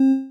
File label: speech noise; TaSTT